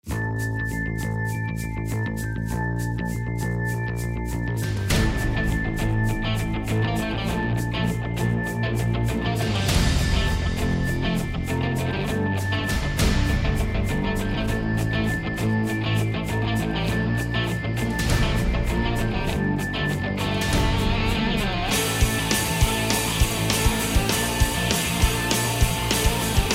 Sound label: Instrumental